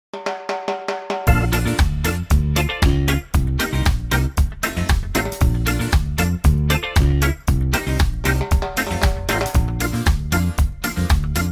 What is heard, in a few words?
reggae Bb